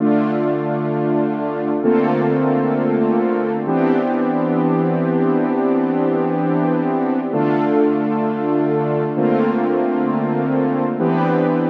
Freeze Pad

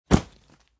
backpack drop

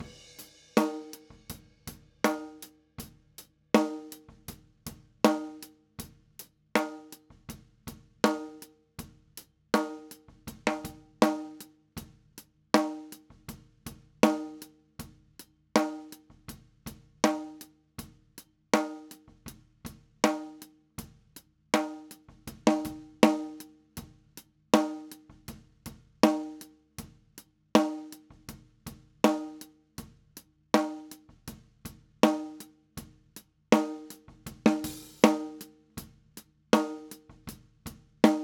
Snare Top

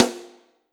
Snare Bright Room Stick Rim Hard

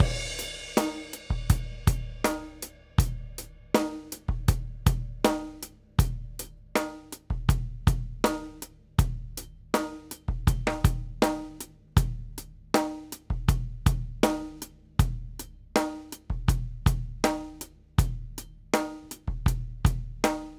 Basic Rock

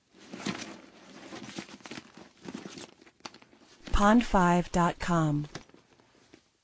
purse movement

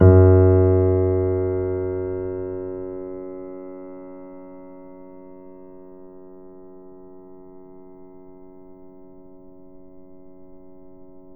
Grand Piano F#1 mf

